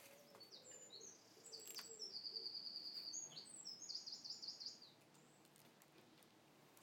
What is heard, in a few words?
brief burst of beautiful birdsong, garden